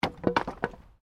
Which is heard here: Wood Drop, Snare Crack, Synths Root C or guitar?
Wood Drop